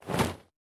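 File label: sounds of parachute jump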